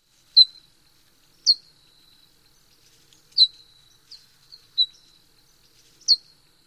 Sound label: call